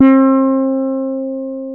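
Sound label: fretless C4, bass; InVision Interactive; Lightware VOL-1; Instruments & Percussions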